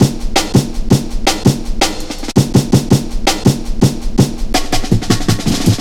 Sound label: 165, drum loops, drum & bass